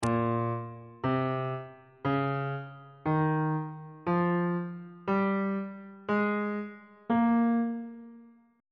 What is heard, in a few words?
b flat dorian mode note positions on bass clef